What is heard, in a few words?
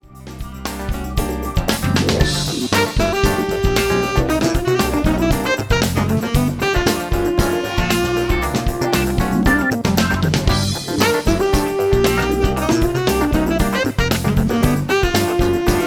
Funk, speaker test